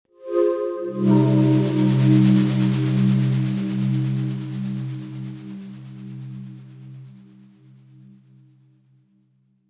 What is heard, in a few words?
Pad; PatchFactory